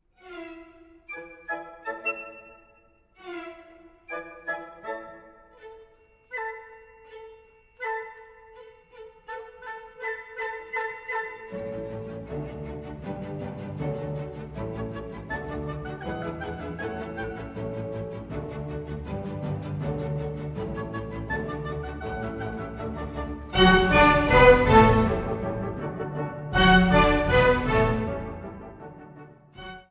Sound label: piano